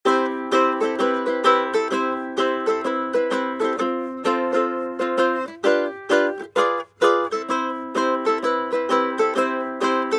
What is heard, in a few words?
guitarrico strumming sequence; loops